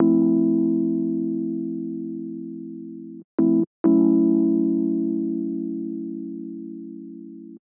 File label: 125 Rhodes Dom A, Keys, Nu Jazz House; PowerFX - Nu Jazz House